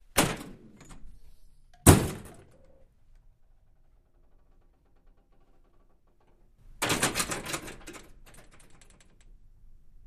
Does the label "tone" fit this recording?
No